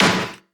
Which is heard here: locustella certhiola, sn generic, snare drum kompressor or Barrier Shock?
sn generic